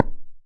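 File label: Percussion Tabla; Polyend; Simulacrum Sounds; 1 Shots; Acoustic and Electric; Drums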